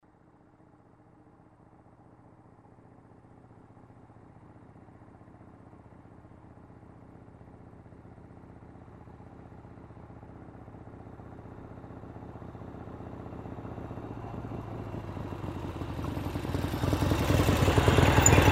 Harley-Davidson motorcycle approaching, waiting, turning off